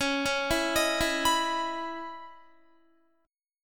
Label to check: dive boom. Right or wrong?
Wrong